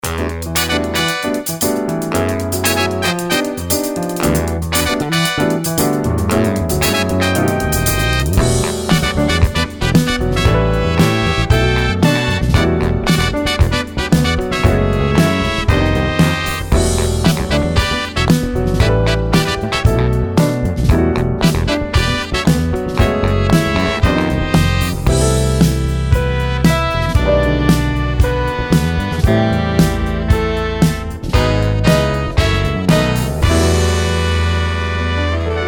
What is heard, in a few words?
Ultra Funk